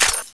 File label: chain; cso; skull6